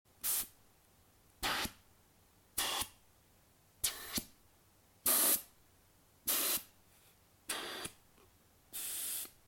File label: bb no mouthpiece just air